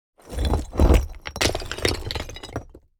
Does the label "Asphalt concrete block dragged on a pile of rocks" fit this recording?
Yes